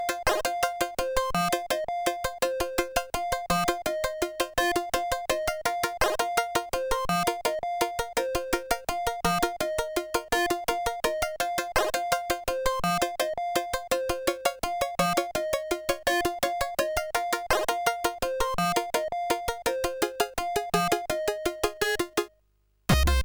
Remix, CSG8580R5; After Earth Remix

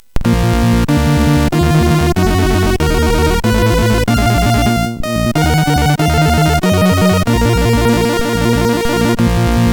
JAZZZZ, MOS6581; MOS6581R4; hvsc

